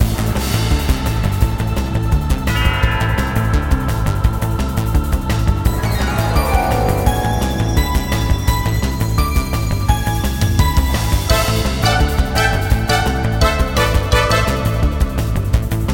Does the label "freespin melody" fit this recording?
Yes